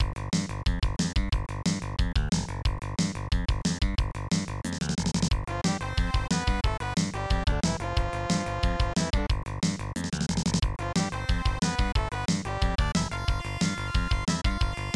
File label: Level Music; Harley's Humongous Adventure; snes